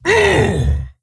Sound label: Wound Crit; Kologarn